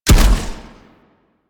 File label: Grenade Launch; Warframe; Vehicles; NechroMech; Abilities